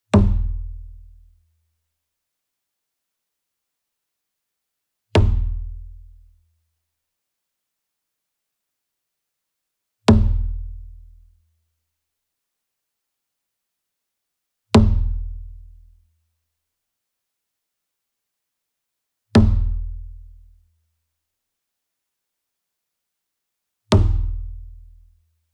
SINGLE DRUM Kodo Hit Soft Beater Near, Cinematic Strikes; boom library; Cinematic Strikes Construction Kit